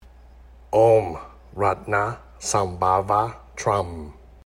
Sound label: Ratnasambhava Buddha Mantra